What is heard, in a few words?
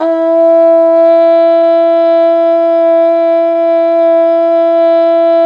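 brass plunger trumpet, plunger mute; Plunger & Wa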